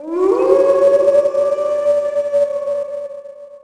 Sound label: wolf; animal; Tony Hawk's Project 8; XBOX